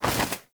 Bird Of Prey Wing Flap Up; Characters; Warframe

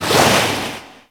Shark Tail Move Fast; Warframe; Grineer Ocean Shark

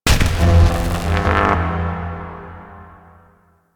Electricity Spark; Warframe; Ambience; Entrati

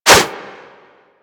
Whoosh, Weapons; Warframe; Furax

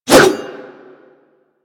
Dagger Heavy Whoosh; Warframe; Weapons; Dual Daggers

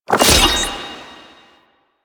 Delivery Key Pickup; Warframe; Nineteeen Ninety Nine; PvPvE

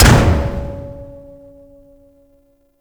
Door Finished A; Warframe; Ambience; Grineer; Grn Door

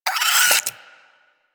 Vocal Attack Short, Hunting Critters; Warframe; Cryptilex

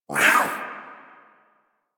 Feral Vocal Attack Short; Warframe; Catbrow

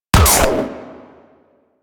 Harpoon Gun Charged Shot; Warframe; Sharkwing; Grineer

